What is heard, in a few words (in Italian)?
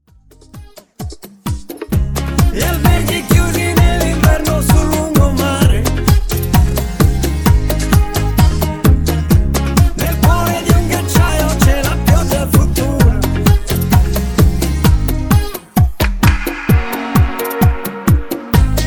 Bachata Ballo Di Gruppo